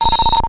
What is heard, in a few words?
BUTTON